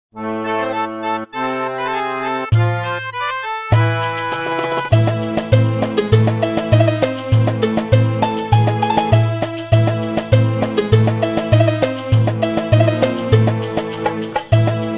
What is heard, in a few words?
Desert Theme; SMM2; SMW